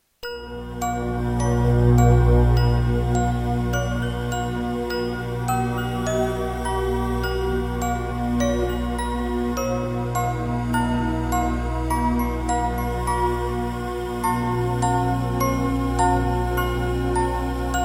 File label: Ambient Music; Hachi Shaku Hachi wa Keraku Meguri Igyou Kaikitan The Animation